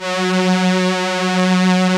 vocoders; Sound & Vision - Gigapack I CD 2

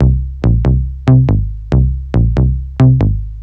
NRG Bass, Trance

